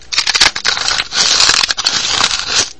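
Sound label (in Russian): из формы для льда достают лед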